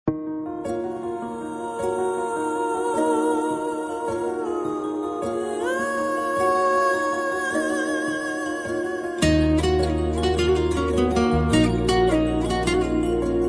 movie bgm ringtone romantic bgm ringtone telugu love ringtones